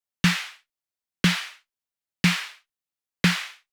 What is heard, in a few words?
Snare